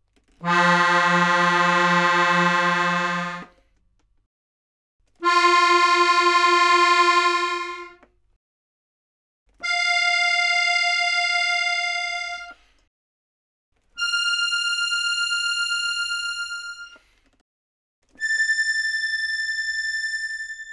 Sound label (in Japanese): Free Reed, 気鳴楽器